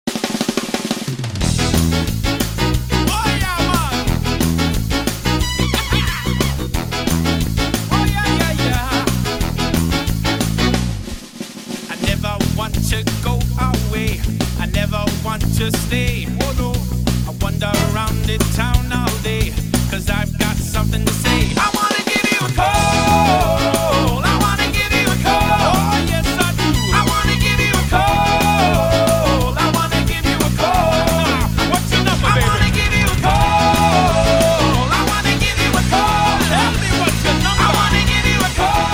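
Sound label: Sony Ericsson ringtone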